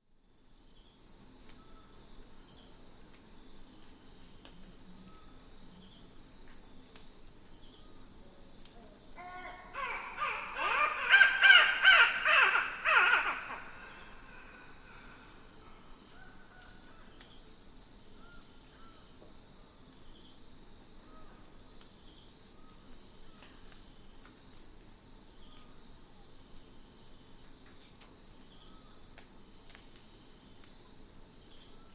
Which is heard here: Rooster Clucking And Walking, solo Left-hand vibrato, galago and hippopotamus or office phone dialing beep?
galago and hippopotamus